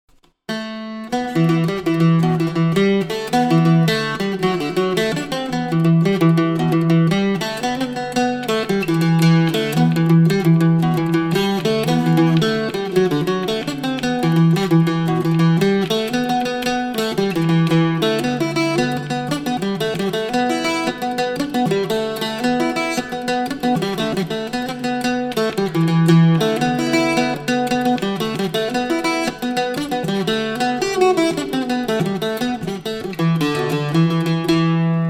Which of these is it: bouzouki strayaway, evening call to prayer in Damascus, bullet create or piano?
bouzouki strayaway